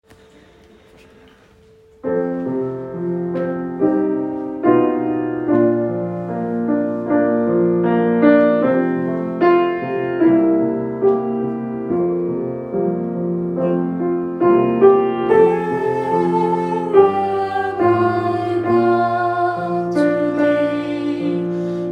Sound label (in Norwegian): sopran, gospel; Nearer my God; kongsberg; kor; gospel for alle